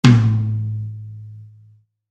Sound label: tom, Drum Kit